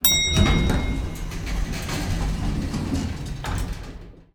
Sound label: elevator arrive